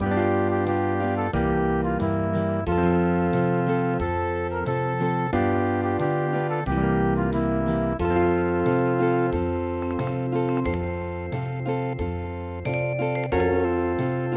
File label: Hawaian